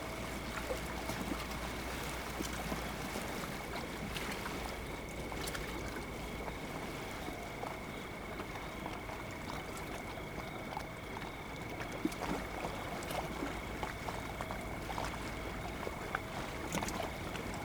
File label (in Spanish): Ambiente noche Amsterdam; Yesterday Origins